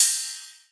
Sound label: Ride; Hats and Cymbals